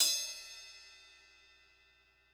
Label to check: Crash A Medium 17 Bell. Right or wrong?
Right